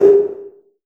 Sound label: LARGE ROOM 808, REVRB